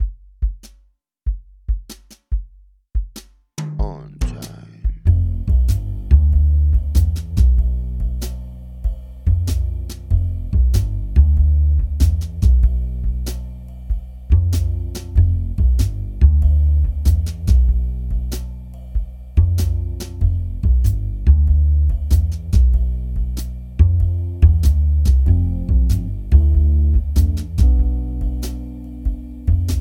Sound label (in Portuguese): Musica ambiente